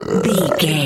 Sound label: zombie voice groan croak